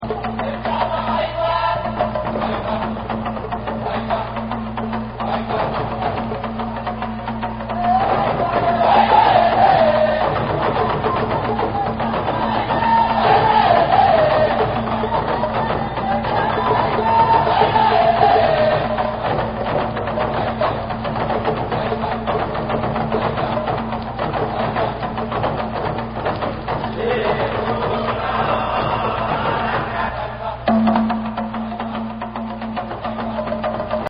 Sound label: Village Dance, African Safari; ringtones